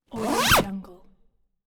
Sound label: zipper open